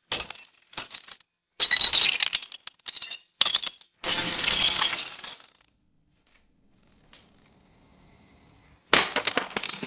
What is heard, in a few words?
thin glass shards drop and shatter on concrete